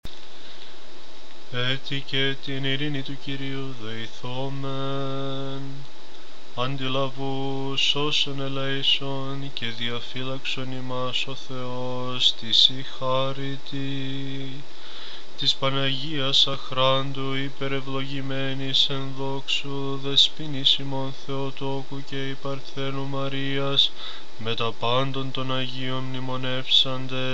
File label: greek small litany